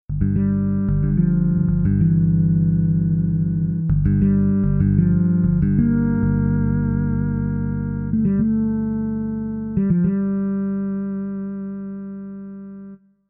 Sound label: fretless bass comp